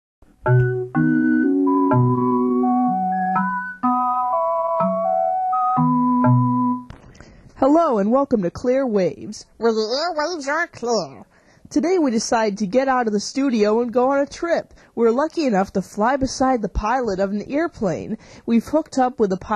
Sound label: Airport